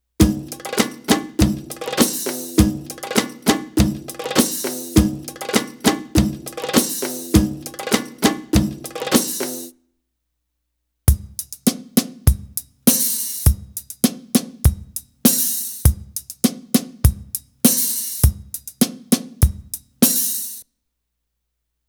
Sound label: Arabic Rhythms